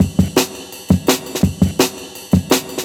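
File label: Jungle Breaks, 168 bpm; Original Jungle Breaks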